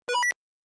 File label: walkie talkie beep sound effect